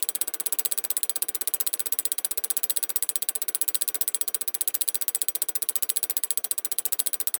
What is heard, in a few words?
Cards Move; goldenClover